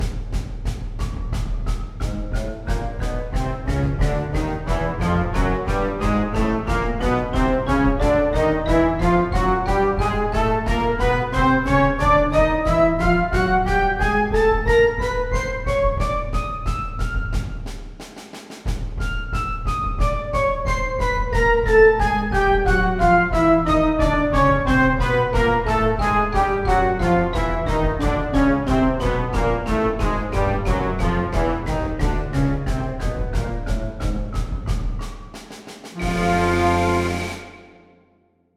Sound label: Advanced Chromatic Scale Exercise